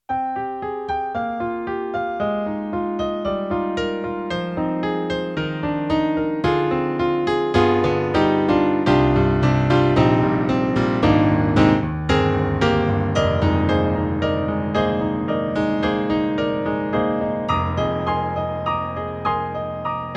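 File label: EDIROL SD-80, Stereo Piano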